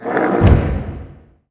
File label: stomp; unreal; titan